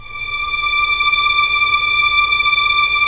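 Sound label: Strings Violins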